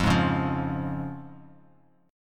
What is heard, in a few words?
F Minor Sharp 5th, chord; Fm#5 strummed; JGuitar